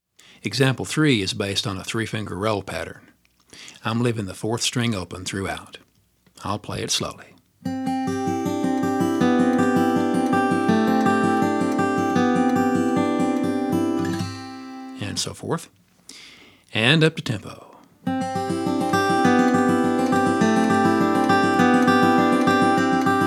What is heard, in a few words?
high string guitar